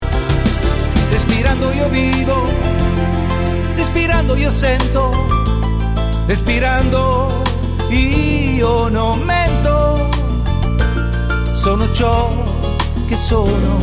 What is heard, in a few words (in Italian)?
Respirando